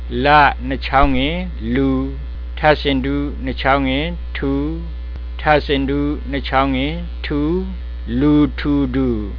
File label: big crowd; Burmese